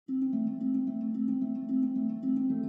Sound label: Harp normal